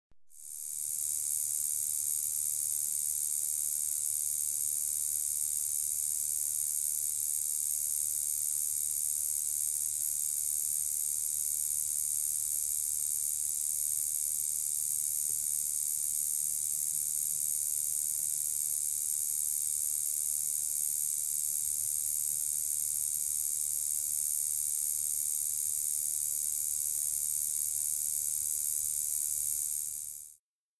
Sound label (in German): rösels beißschrecke, heuschrecken; Smart Environment/Natura 2000 Living Lab Heuschrecken und Fangschrecken im Natura 2000-Geb